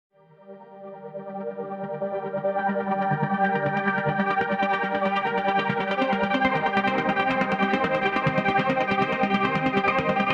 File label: ocean space drift trance